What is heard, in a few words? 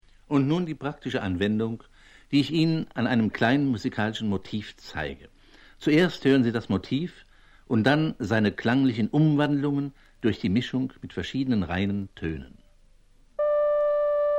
ring modulator